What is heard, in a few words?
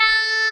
"aaa" A5; 音MAD; 兽娘动物园